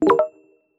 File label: whatsup alert